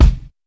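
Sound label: RAW bass drum, Drums Acoustic; AKAI; 90s sample CD; USB Soundscan vol.10; RAW KIT 9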